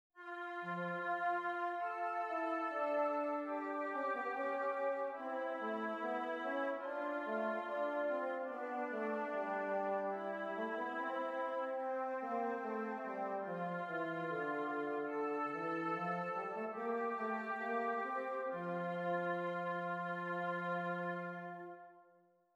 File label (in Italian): esercizi di contrappunto semplice A due voci; 4 gattung; Ronge